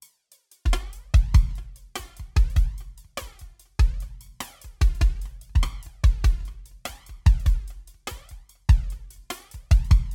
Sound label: TC2290 DT Drums Mod Feedback